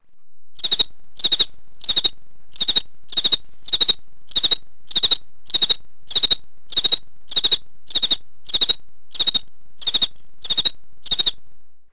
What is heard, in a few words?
CRICKET